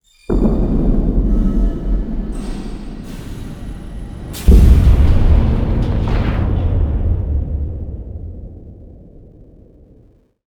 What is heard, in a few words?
matrix door